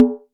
mid tom drum sound b key, tom drum sound tuned to the B note